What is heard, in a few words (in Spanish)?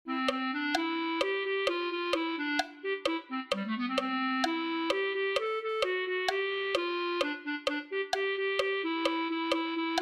clarinete